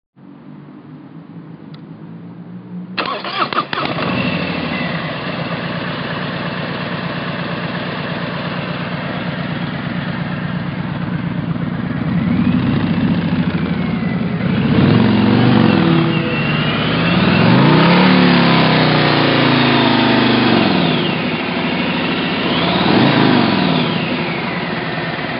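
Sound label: Harley-Davidson Street Glide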